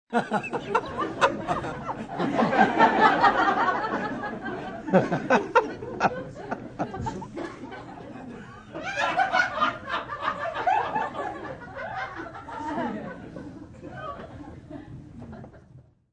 Group Laugh Bar, Laughing, live ambience, bar group of 10-20, you can hear individual people; human voice; Laughter; VoiceBox